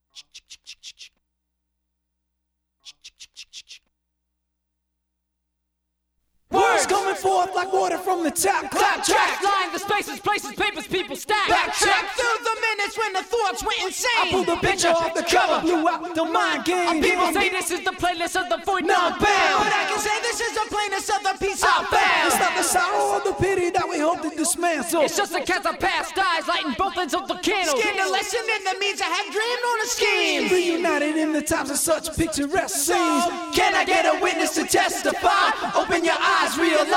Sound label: acapella